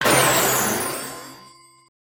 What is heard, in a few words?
flag pickup